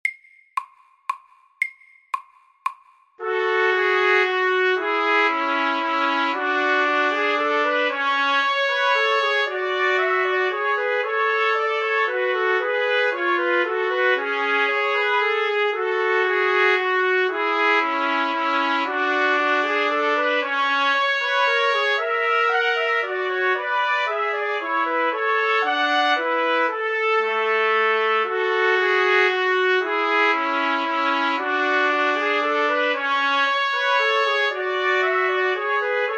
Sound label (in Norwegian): Menuett i Giss-moll